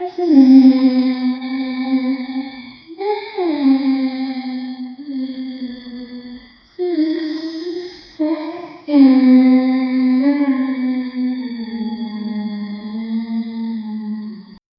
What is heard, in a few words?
a creepy girl smile getti